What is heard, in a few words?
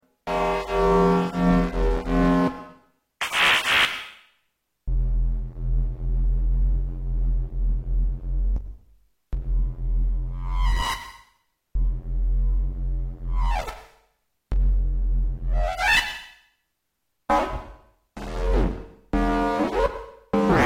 light sabre